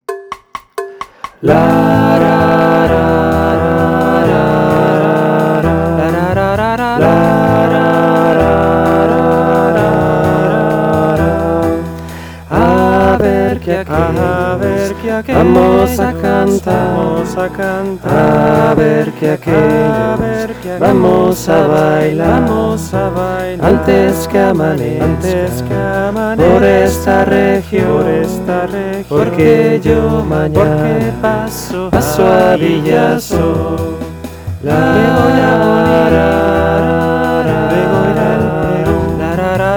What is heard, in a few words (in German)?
Stimme; Tutti